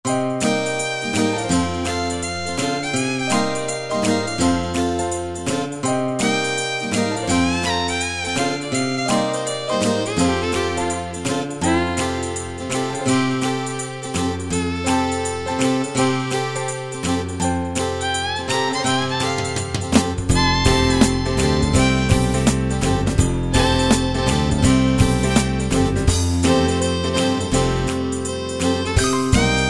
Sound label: Country Folk Rock, Yamaha QY70; Preset Styles